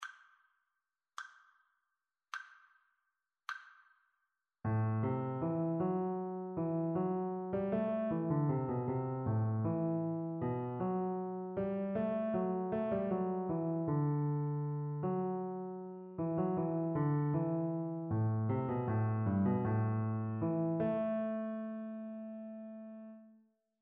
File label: melodic dictation; eartraining